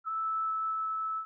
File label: trumpet